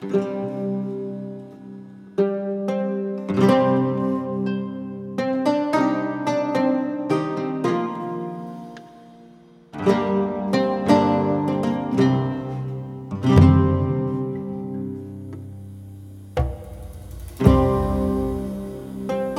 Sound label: bg music